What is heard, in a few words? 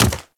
Step Default; Warframe; Ballas Chimera